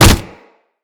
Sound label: Generic Hit; Tenno